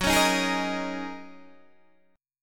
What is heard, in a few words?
Gb 6th Add 9th, Guitar, DADGAD